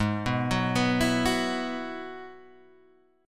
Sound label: Ab Minor 7th Sharp 5th Ab, Guitar Standard; arpeggiated; JGuitar